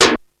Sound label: METAL, ELEC PRCS; East West; Global Sounds